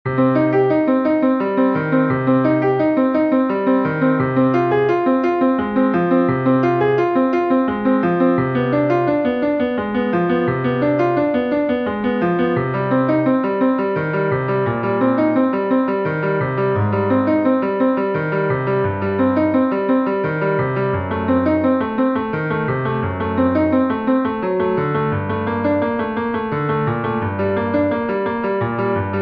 Prelude In C Minor; Bach; BWV 999

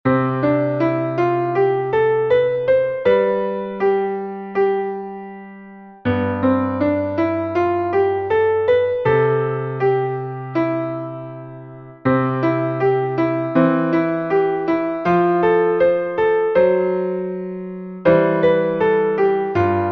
Scales And Arpeggios; Disney

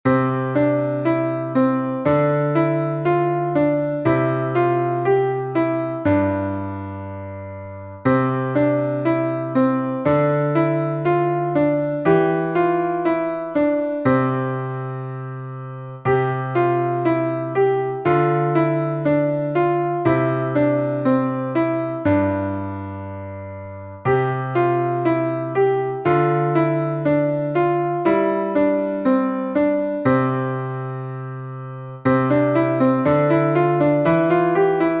3-Note Scale Fragments

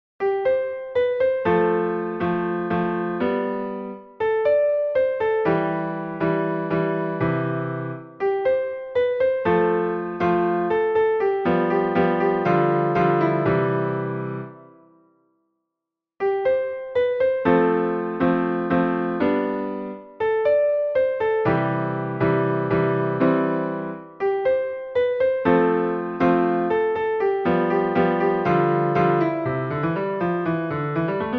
Easy Piano

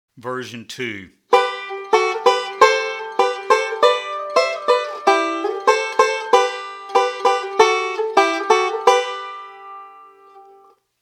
Using Thirds And Sixths

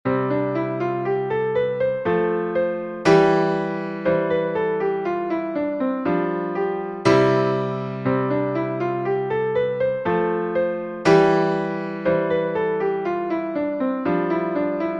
C Scale Stomp

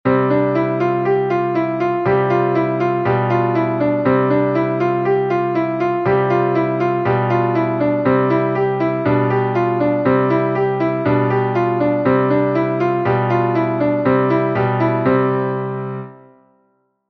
Czerny Style Eighth Notes, Piano Warm Ups

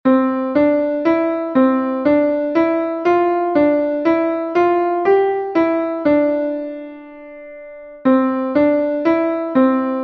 3 Note Scale Fragments, Piano

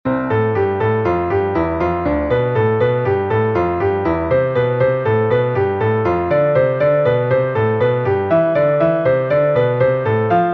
Warm-Up, Piano; Hanon

